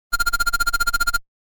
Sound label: free Life; Frogger; Arcade